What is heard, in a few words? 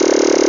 count Sound Middle; jackpot 6000